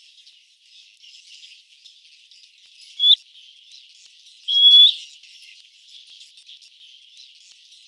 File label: Eared Grebe Podiceps nigricollis; waterfowl